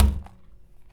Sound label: Good Thunk; BB Retail Therapy Sample Pack